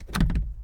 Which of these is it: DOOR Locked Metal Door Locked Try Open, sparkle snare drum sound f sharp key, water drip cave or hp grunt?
DOOR Locked Metal Door Locked Try Open